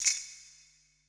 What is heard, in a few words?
E SHELL, ETH PERC